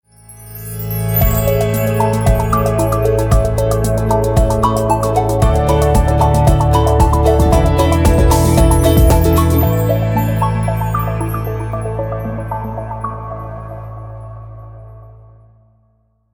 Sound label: inspirational audio logo; fsm team